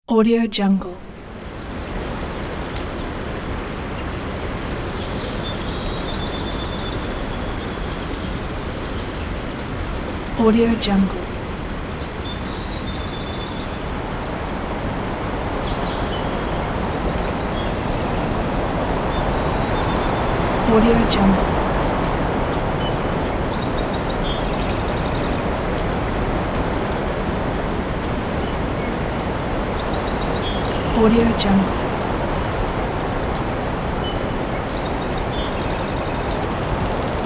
sea shore wind

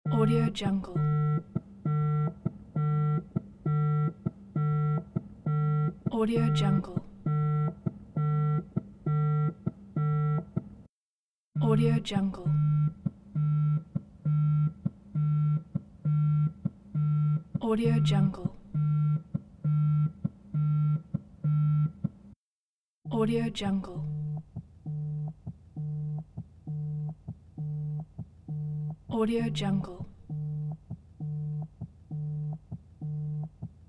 phone vibrate

